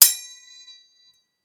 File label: Metal Ping